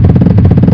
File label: rumble; Superbike 2001